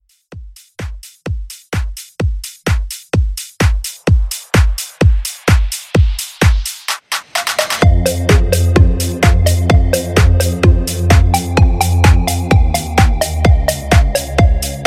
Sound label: Edit